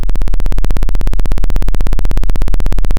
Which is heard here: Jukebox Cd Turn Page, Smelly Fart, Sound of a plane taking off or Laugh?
Sound of a plane taking off